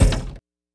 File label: flame vent open stop; Armored Speakerman TITAN